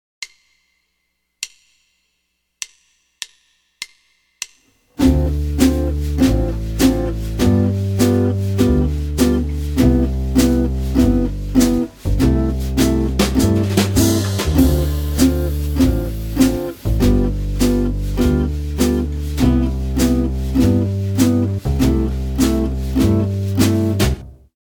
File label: 2 5 1 C Major, jazz guitar